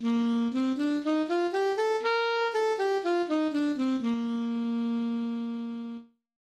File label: major scales alto saxophone